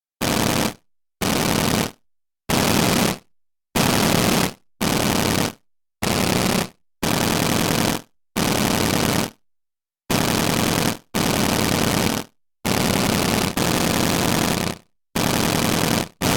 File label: stylized machine gun shots